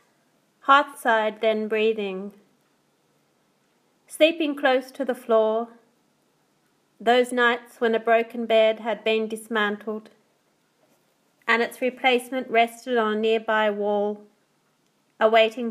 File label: hearthside then breathing recording